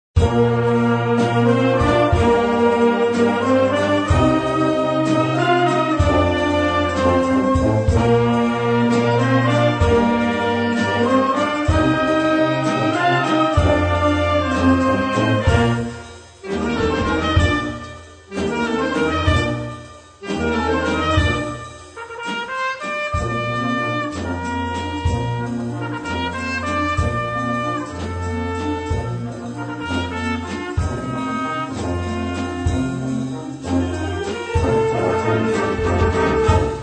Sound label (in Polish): zlote trabki